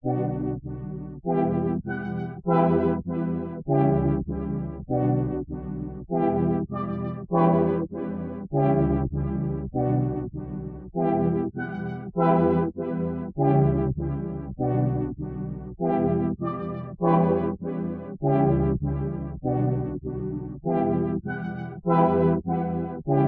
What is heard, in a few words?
99 BPM B Min Synth, melody loop stem; Loop Stems; Cymatics; 2022 Melody Collection - Various; Breeze